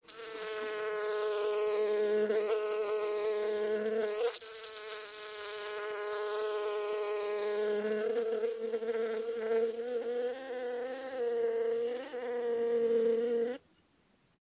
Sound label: busy bees